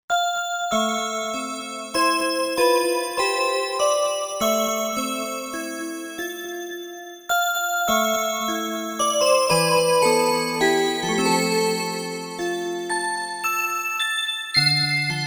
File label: Melody Bell, Korg MSC-1S; Korg MSC-1S Variety